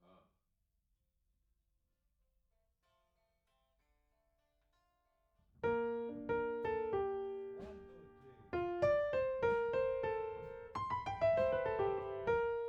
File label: Piano A